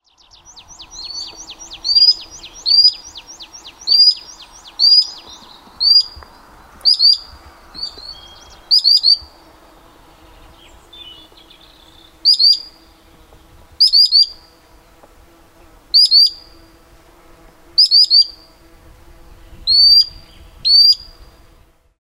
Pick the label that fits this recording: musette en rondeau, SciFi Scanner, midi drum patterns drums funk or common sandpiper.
common sandpiper